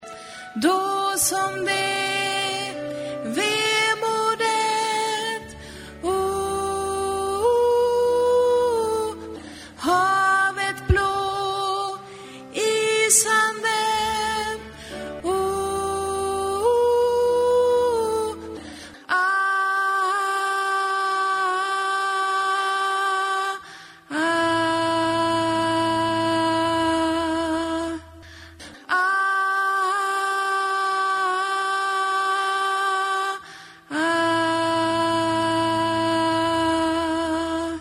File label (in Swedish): sopran